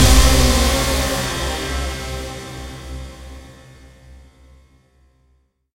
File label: stinger; Mirror's Edge (2008)